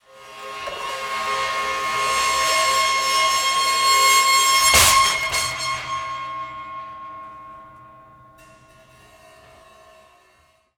Prepared Drums

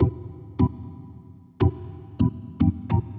ORGAN; Big Fish Audio - Hardcore Breakbeat Action; Hardcore Breakbeat Action CD1; 07 Testify 150 Emin

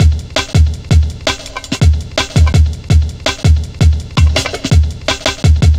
Drum Loops, 165.5, Jungle

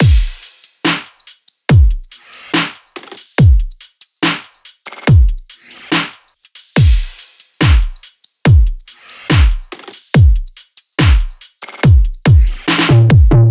loop full 142; Seismic Loops

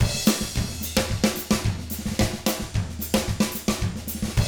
110 SAMBA, Drums; ILIO; Double Platinum Drums 1

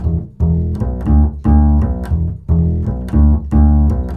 CHASE E, Bass; Spectrasonics; Bass Legends

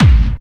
KICK hardcore, Techno Trance DRUMS

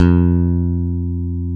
F-3 pick, pick bass; AKAI S-Series CD-ROM Sound Library VOL-7

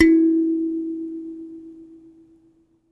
Finger, Prepared Kit; Battery 3 Sample Kits

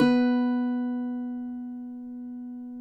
cuatro; Spectrasonics; Hans Zimmer Guitars VOL-2

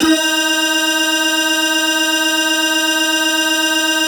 synth clav

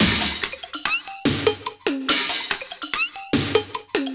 144 ELEC LP 4, Industrial Loops

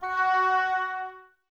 OBOES F#, Woodwind Brass Ensemble; Wood Winds; Miroslav Vitous

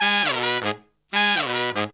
horns, 160 bpm; 90 s Sample CDs; Zero-G; Phantom Horns

